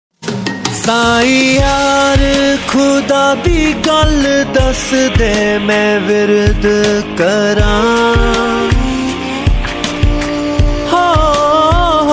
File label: islamic ringtone